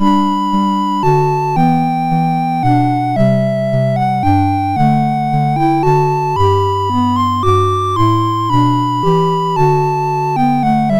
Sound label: Irish Castle A, MOS6581R2